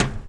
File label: metal step; Golden Crayon Sounds